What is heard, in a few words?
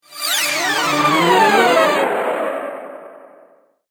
Space Brakes Flashback Whoosh, whoosh sound effects